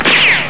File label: RICOCHET; Red hiding hood anim